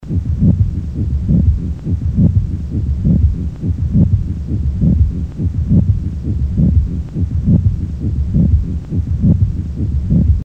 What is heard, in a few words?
rub